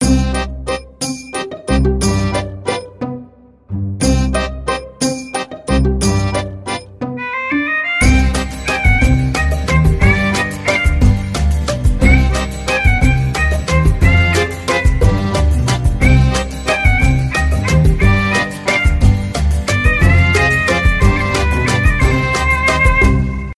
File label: Shopping Mall BGM